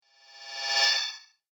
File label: Future Motion, Science Fiction Sound Effects